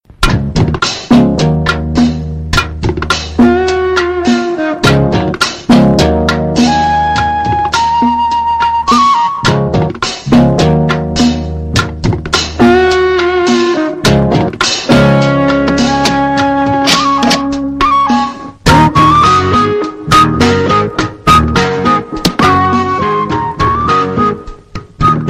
Funny Bgm; Chithram

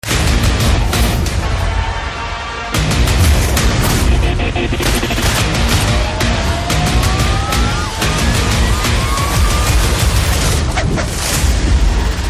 Trailer Ringtone; Batla House

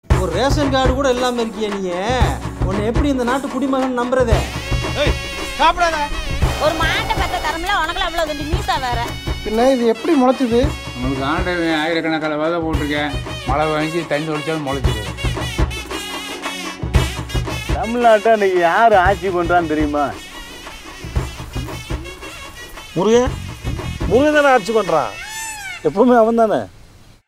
Trailer BGM Ringtone; Kadaisi Vivasayi

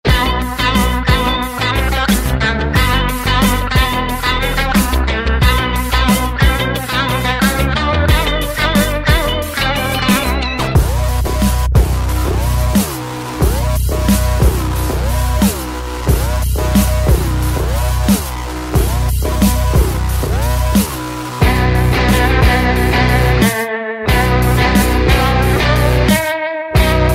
Theme Music Bgm Ringtone; Kabali

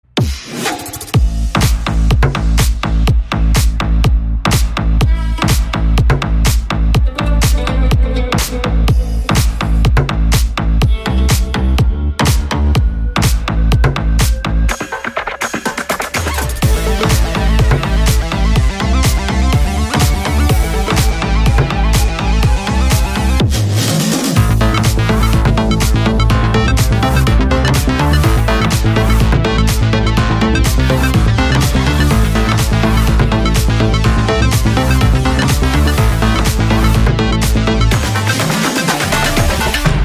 Fight BGM; Metro Train

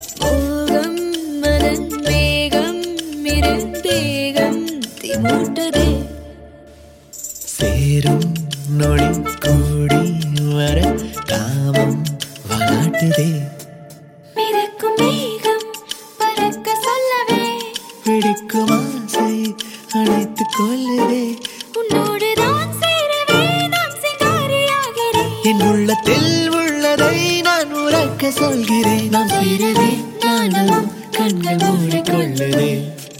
Female Ringtone

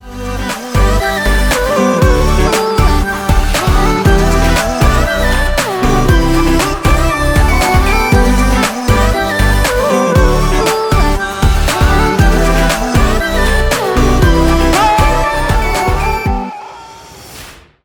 Instrumental

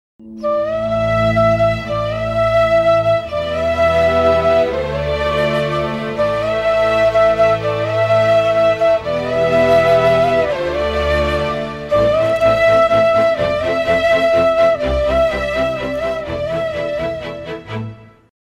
Gets Emotional Bgm; Raghuvaran

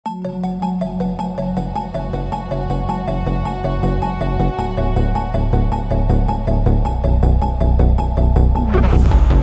EDM Version Bgm

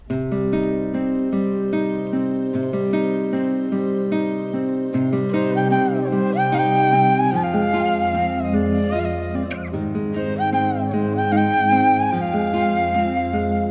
Sad Love BGM

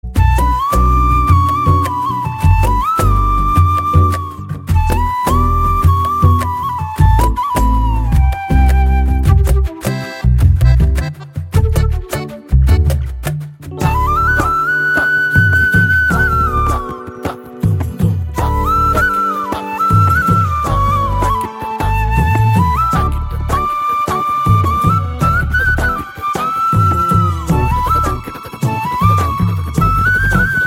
Flute; Badava Rascal

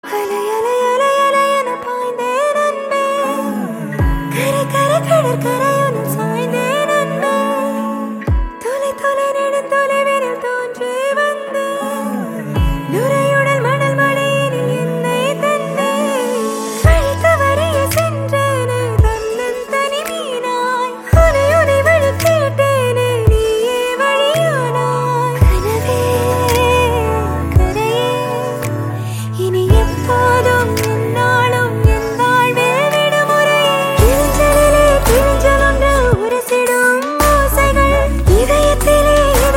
Female

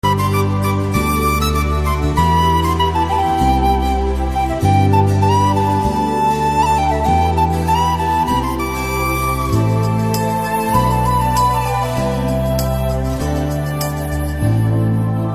BGM Flute

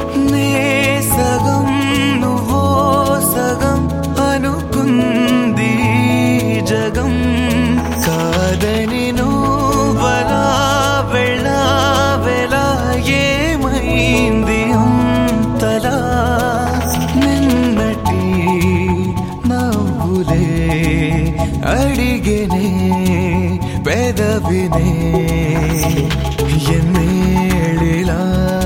Male Version Ringtone

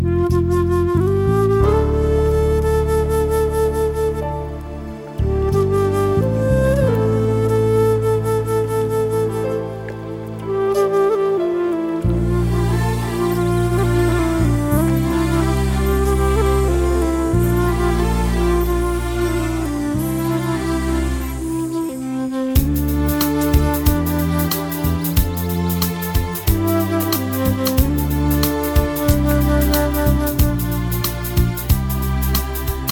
Flute; Kaakha Kaakha

